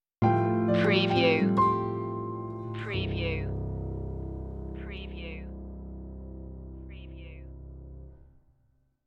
natural ways stinger

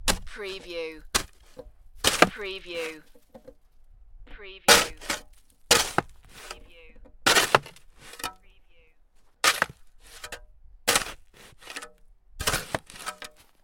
Shoveling snow or dirt

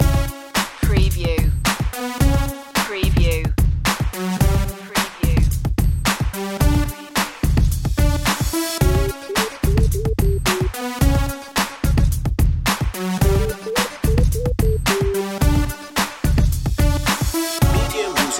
funk runner

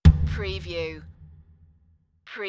Impact High Tom

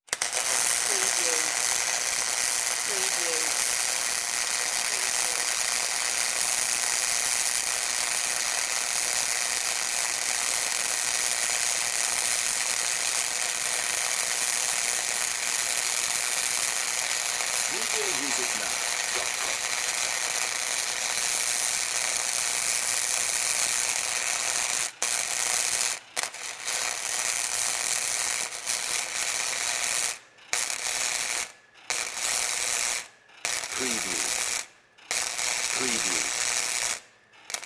Arc Welder Running

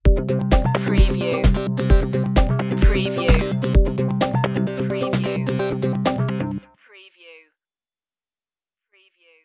stinger, chill

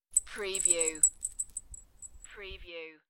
Christmas tree bell decoration; beanstalk audio

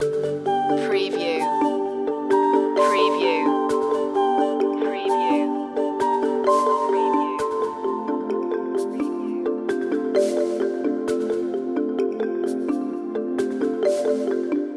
marimba mood loop

